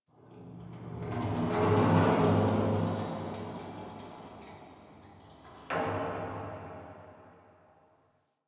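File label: Distant Metal Garbage Wronk; Warframe; Nineteen Ninety Nine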